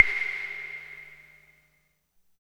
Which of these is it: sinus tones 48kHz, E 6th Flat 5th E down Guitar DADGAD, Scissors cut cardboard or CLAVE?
CLAVE